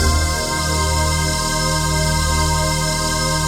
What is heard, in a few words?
VOICE PAD; Best Service; XX Large; Pads